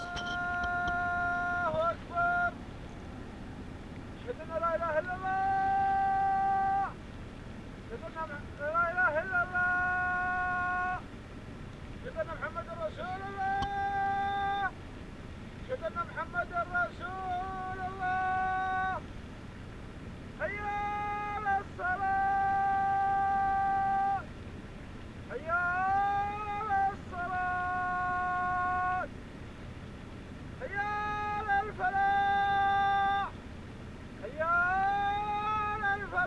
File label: saz; サウジ・アラビア３